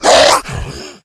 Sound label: rage, infected, male